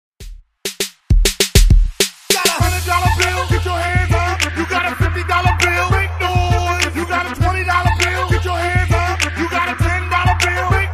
hype

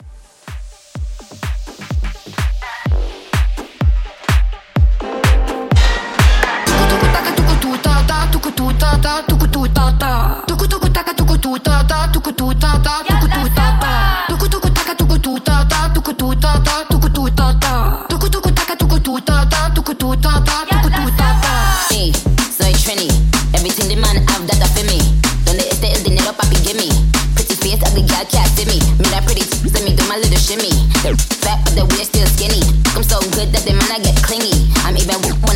Hybrid Edit 126

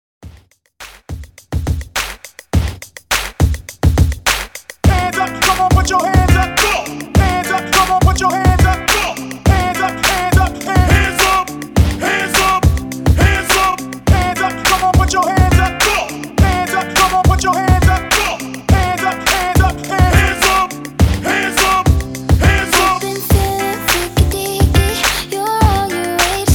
PARTY BREAK 104 BPM

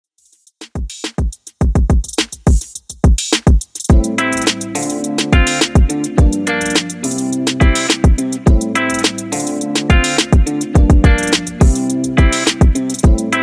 Hip Hop Redrum 105Bpm

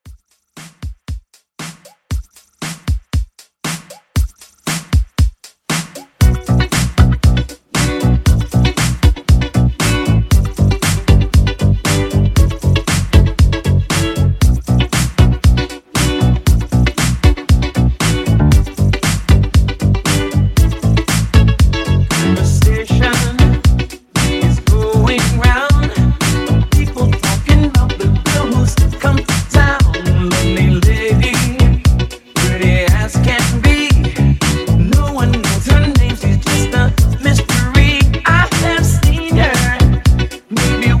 Dance Re Drum 117; remixplanet

